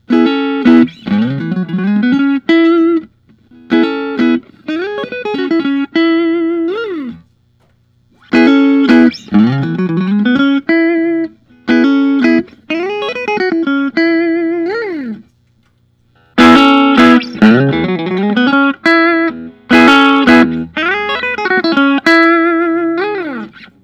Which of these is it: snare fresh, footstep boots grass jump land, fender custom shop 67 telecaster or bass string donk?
fender custom shop 67 telecaster